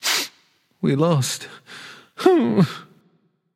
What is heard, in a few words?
boohoo; fenrir